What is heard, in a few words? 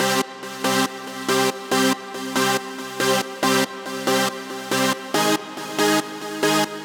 140 House Leadsynth Cmin; Dance; DJ; Pro Tools Essential Loops; Digidesign